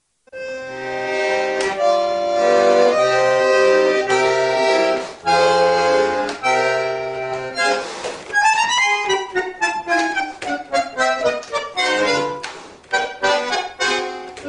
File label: bandoneon; instrumentos